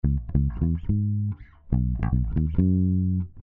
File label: Ska Bass; Lounge & Reggae; Loopology; Ska Till Ya Drah